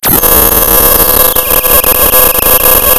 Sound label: Sound of tearing paper, center